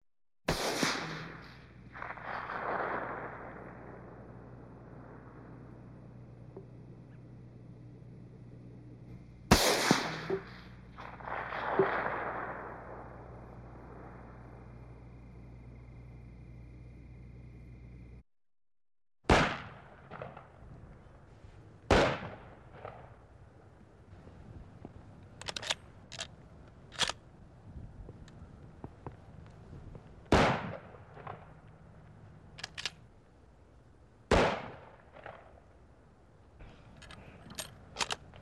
oruzhie vistreli iz vintovki perezaryadka

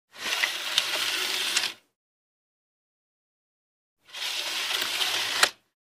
shtori nebolshie shtori razdvigayut i zadvigayut

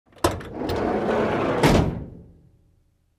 zakritie bokovoj dveri gruzovogo avtomobilya

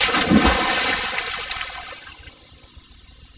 voda odinochnii zvonkii vsplesk

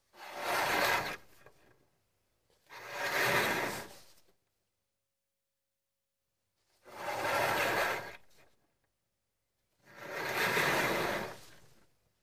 shtori otkrivanie-zakrivanie s usiliem

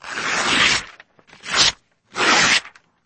zvuk kogda razrivayut bumagu

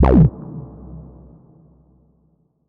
ejsid haus odinochnij v prostranstve myagkij

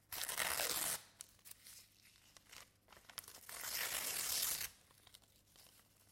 akkuratno otrivayut kusochki ot lista bumagi

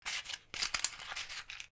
shelest perebiraemih bumag

